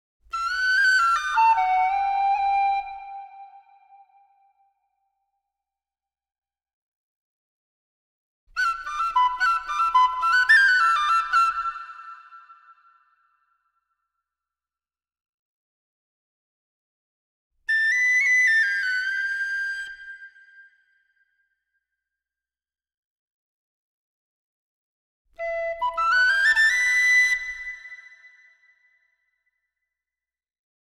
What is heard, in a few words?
Jingle B Tin Whistle version; Secrets of the Silent Witch Original Soundtrack (2025)